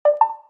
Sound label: pop box alert; Samsung; SGH-T369 Linx